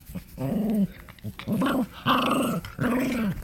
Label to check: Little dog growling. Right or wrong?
Right